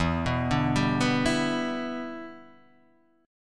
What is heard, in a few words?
E Minor 7th, Guitar, Drop D